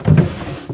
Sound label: DRAWER OPEN